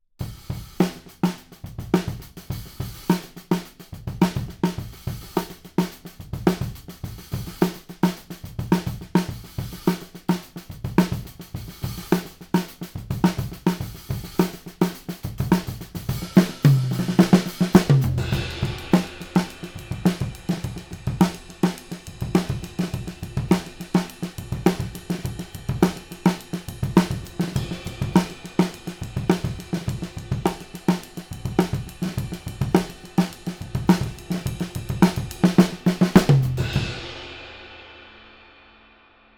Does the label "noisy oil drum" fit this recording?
No